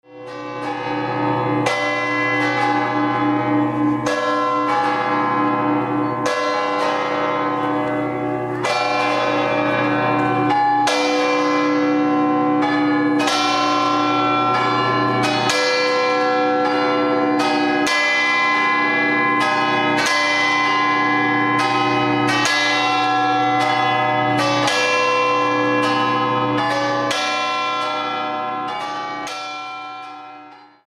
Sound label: pisa bells